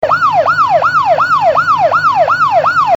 siren wail; garrysmod

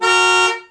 horn simple; garrysmod

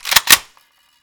ak47 bolt pull; garrysmod

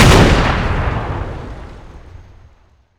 m67 explode; explosive; garrysmod

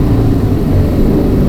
third shift up, car; vehicles; garrysmod; sincar

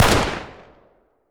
g36c fire; garrysmod

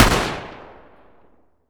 m16a2 fire, weapons; garrysmod

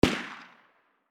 distant sound galil; garrysmod

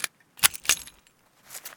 magnum clip out; garrysmod

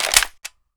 masada clip in; garrysmod

